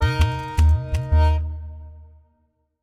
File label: End Stinger; Fishy Scrap Theme